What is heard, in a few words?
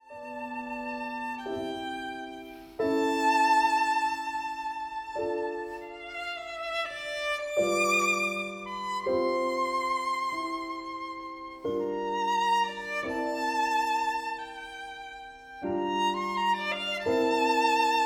VIOLIN